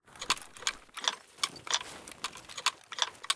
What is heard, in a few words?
sprint assault; STALKER; IWP